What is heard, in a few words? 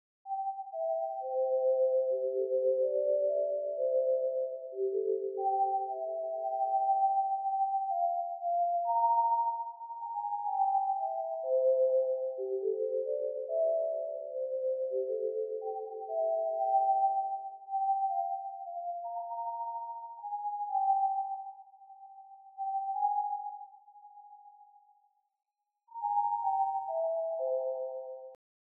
whistle lead